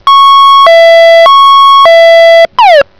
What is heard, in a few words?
hilow